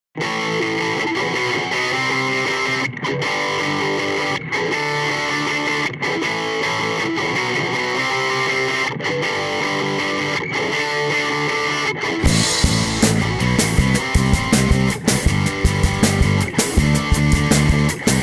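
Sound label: Quartet (vocals, guitar, bass, drums)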